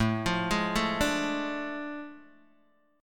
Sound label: A Major 7th Suspended 4th Sharp 5th, Guitar, DADGAD